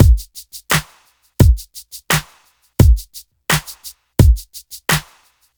86 bpm drum loop sample g sharp key

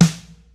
warm acoustic snare sample E key

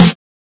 00s prominent steel snare drum sound f key

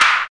clean clap sound clip d sharp key

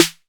good steel snare drum sample e key; snare drum samples

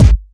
natural kick single hit a key

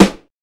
hot snare sound g key

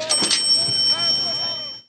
cash register ding

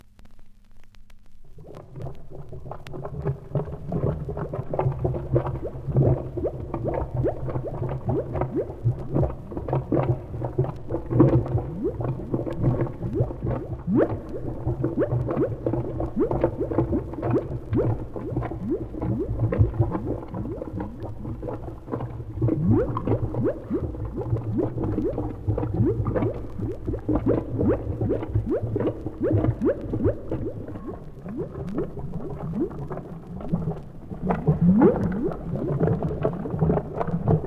boiling oil; vinyl sample

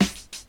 2000s damped steel snare drum g key

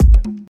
Summer House Tuned Kick; single hits one shots; kick drum samples

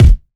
dry kick sound e key; kick drum samples

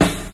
noisy snare sound f key; snare drum samples

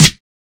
00s shiny steel snare drum sample g sharp key

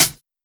soft hat sound sample d sharp key; hat samples

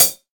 shiny hi hat f sharp key; hat samples

